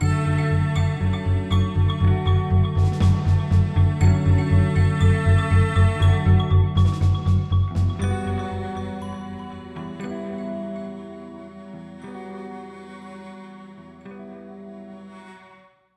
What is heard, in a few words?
Cactos Death; Mushroom Men: The Spore Wars; Wii